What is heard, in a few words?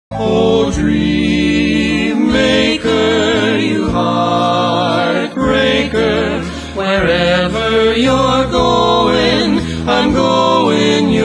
Trio, big band